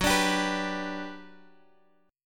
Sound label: G Major F sharp, chord